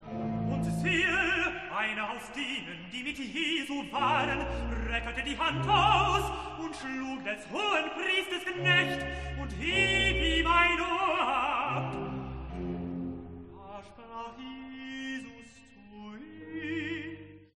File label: evangelist tenor